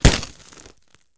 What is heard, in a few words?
Bullet Hits Glass Med